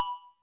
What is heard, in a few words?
Korg ER-1 ping; DRUM MACHINES